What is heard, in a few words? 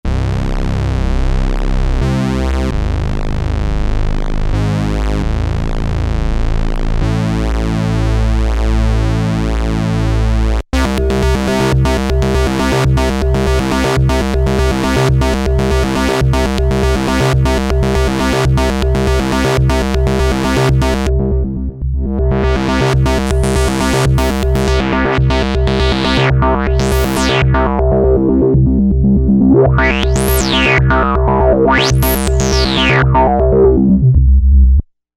wavetable